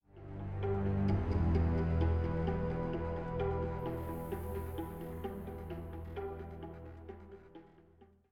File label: Tension; Far Out